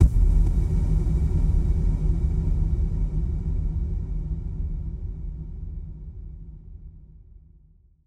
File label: Impact Kick Loop